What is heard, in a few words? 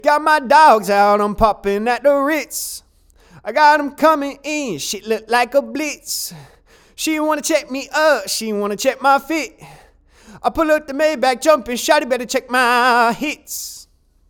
hiphop dry